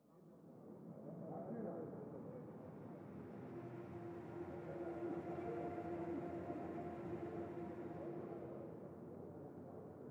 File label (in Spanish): AMBIENTE FONDO